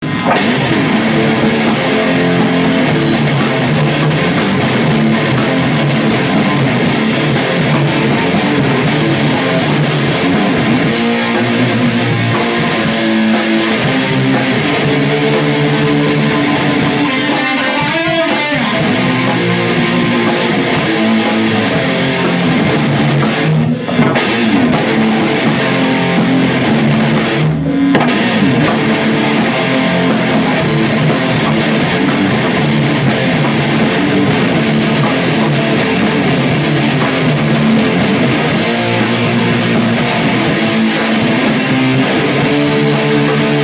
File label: jam room song